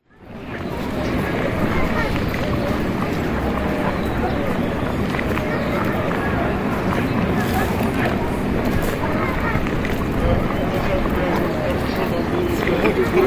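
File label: sounds of city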